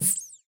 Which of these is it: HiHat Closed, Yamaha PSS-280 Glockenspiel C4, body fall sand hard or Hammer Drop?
Hammer Drop